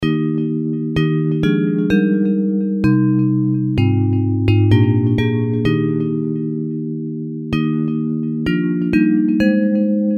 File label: Here O My Lord I See Thee Bells; Hymns; Praise